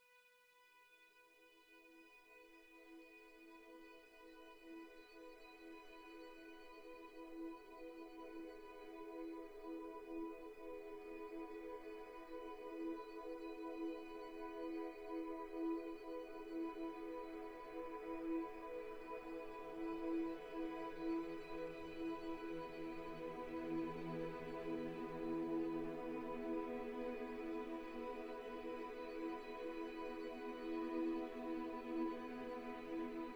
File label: Rebuild Underscore, In Suspense; Narrative Mind